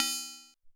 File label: Tk Marac